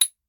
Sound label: equip ring